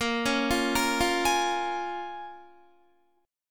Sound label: Bb Minor 7th, Guitar; Bbm7 arpeggiated